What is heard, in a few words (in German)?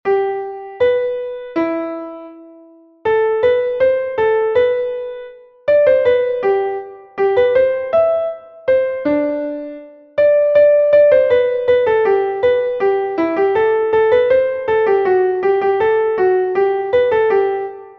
klavier; bona nox bist a rechter ox kanon; melodiemeister